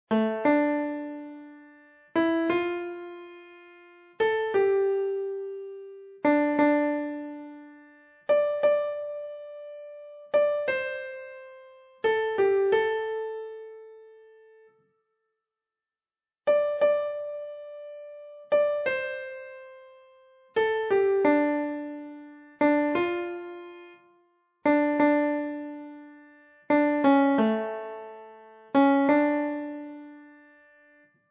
The House of the Rising Sun, Klavier

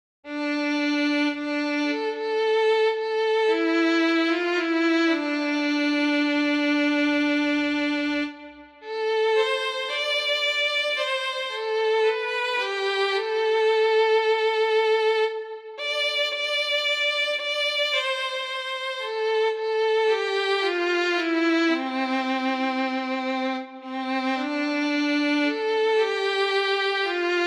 solo Geige; Scarborough Fair

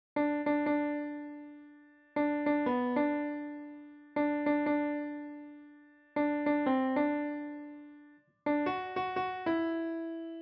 Klavier; amerikanisches Weihnachtslied für Klavier solo, sehr leicht

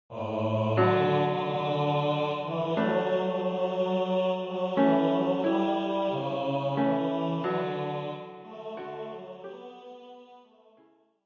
O Tannenbaum

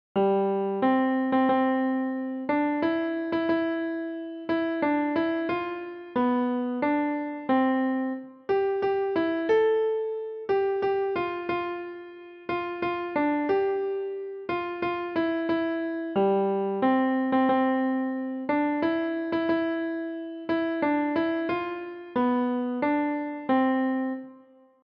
Klavier

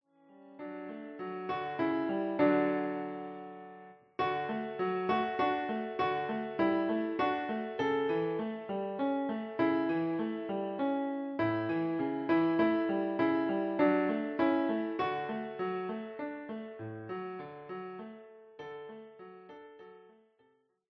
Suesser die Glocken nie klingen, Klavier

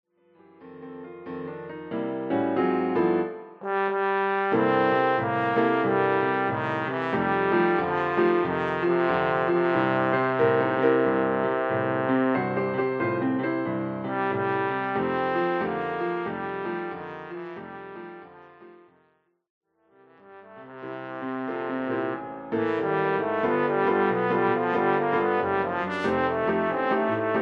Posaune, Klavierbegleitung

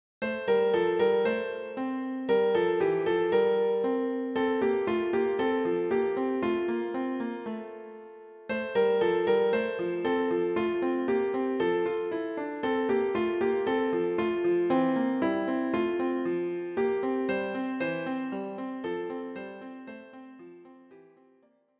Fröhliche Weihnacht Klavier; Weihnachtslied für Klavier; mit Vorspiel; Fröhliche Weihnacht überall - für Klavier mit Aufnahme

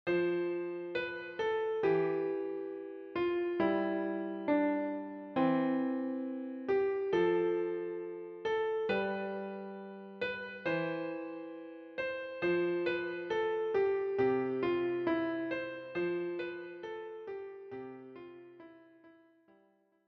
Joy to the world Klavier; englisches Weihnachtslied für Klavier, leicht; Joy to the world - für Klavier, leicht mit Aufnahme

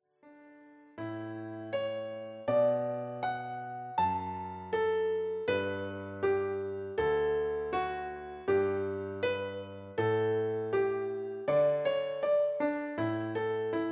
Kanon Pachelbel, Klavier; für Klavier solo, Schwierigkeitsgrad 5, mittelschwer

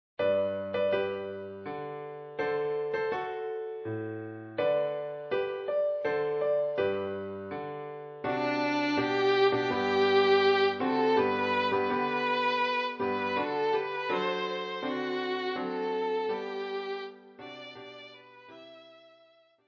violine; für Violine und Klavier, Schwierigkeitsgrad Klavier Level 6, mittelschwer, mit Vorspiel; O Tannenbaum

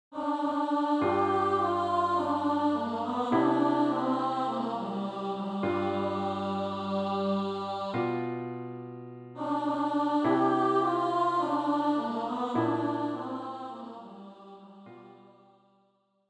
mittlere Stimme

